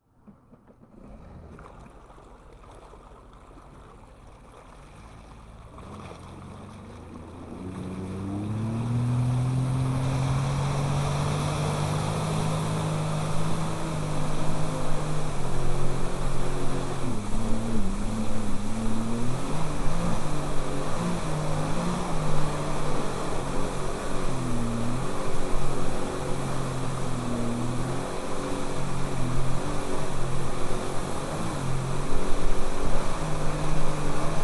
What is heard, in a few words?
personal water craft sea doo GTS 130 on joyriding off; sonniss gdc